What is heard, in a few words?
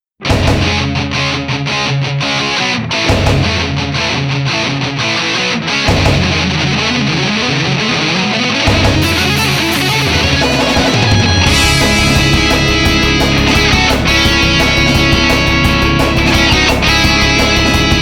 ver. guitar